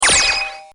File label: UI stop; Paradise